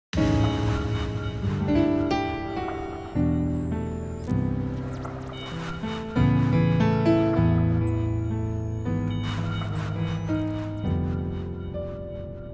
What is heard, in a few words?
ambient